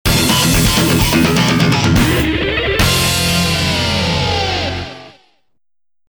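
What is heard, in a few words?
jingle win; VF5FS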